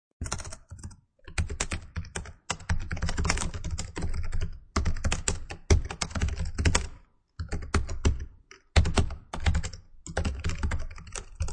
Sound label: Typing quickly, stressed out, trying to make a deadline; Typing Fast; Computers